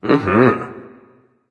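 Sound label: non verbal agree, voice; cernunnos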